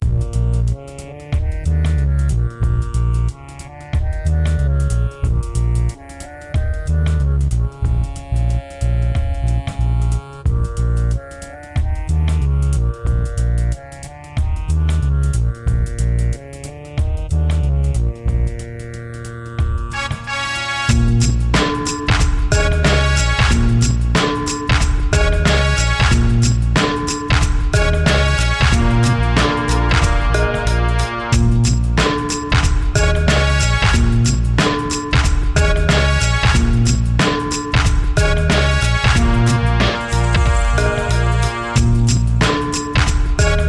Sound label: beat